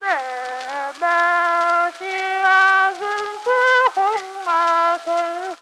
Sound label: A folksong sung in the tune of Mengjiangn; Berthold Laufer China Collection